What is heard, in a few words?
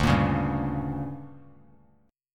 D Major 7th Suspended 4th Sharp 5th, Bass Standard; DM7sus4#5; strummed; JGuitar